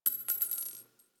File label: Target Practice Shell Casing; gameplay; Warframe; Nineteen Ninety Nine; Quincy